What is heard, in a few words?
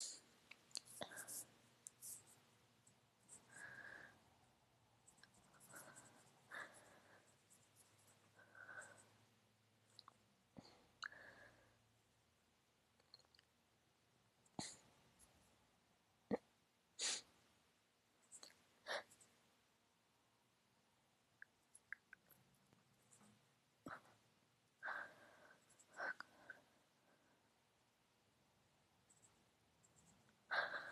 sneaky edging lady noises lots of shaky breath trying to keep quiet; F4A